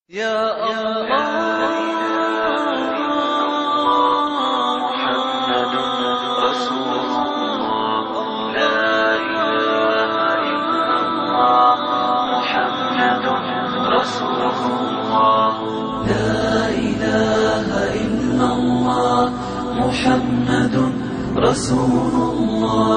Arabic Nasheed

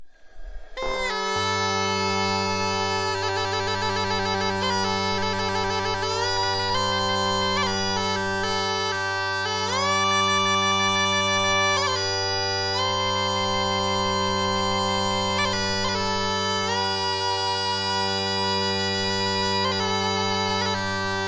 bagpipers sound; latino

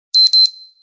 htc alarm; ringtones; sms

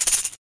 Short Rattle

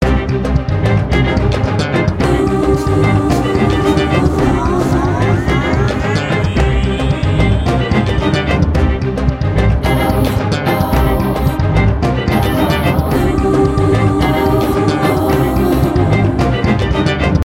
New Turkish Electro

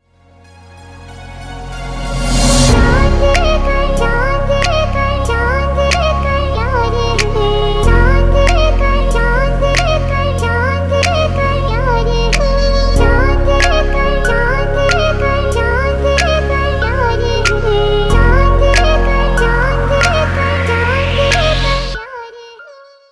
Trap

